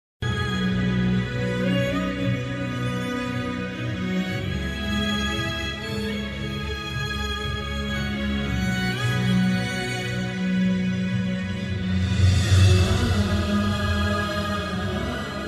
movie Climax BGM ringtone BGM emotional BGM; amaran

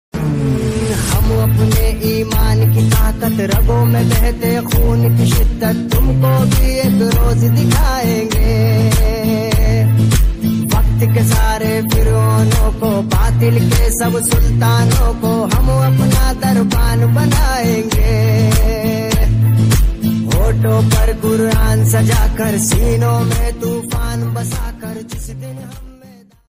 ringtone

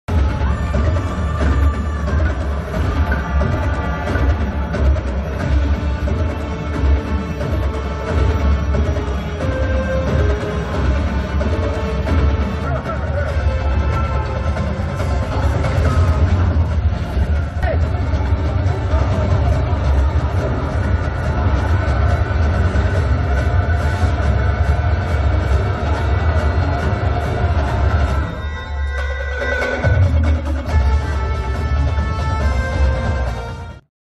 movie interval bgm ringtone

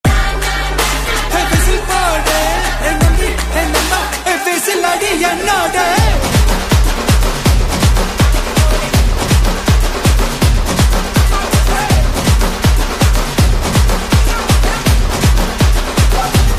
song tamil; goat

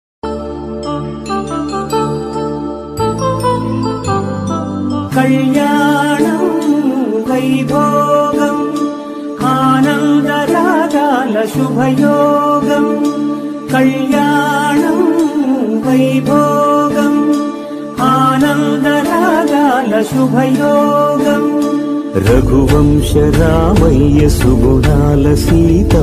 telugu wedding invitation with traditional song

